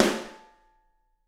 SD, Drums; Bob Clearmountain Drums I

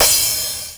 HOUSE CRASH, Drums Dance & Groove; USB Soundscan vol.11; AKAI; HOUSE KIT

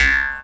C# JAW, Primitive Instruments

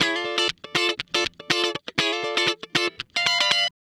Guitar Licks

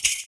FOOT PERC; Univers Sons - Raricussions

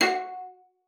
F#4, VIOLA FINGER; Xsample VOL-5

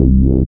SYN 202, Roland MC-202; Sound & Vision - Gigapack I CD 2

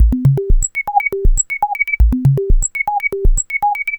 Percussive; Sonic Foundry (Sony Creative Software); Machine Language

